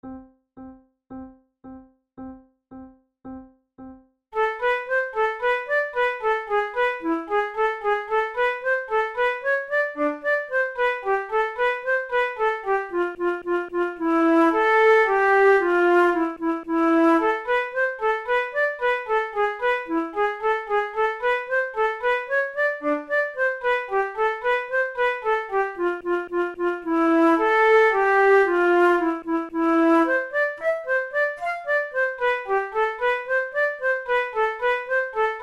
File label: pour la flute duos allegretto